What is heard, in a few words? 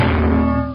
cartoon bonk